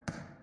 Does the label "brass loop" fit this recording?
No